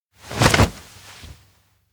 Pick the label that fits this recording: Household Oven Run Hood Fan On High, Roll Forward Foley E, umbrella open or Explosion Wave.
umbrella open